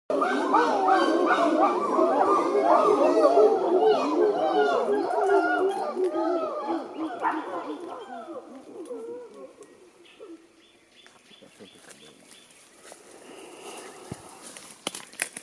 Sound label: Chorus calls